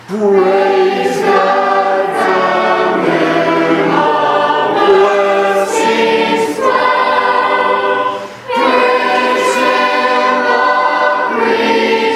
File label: singing class, hymns; praise god from whom all blessings flow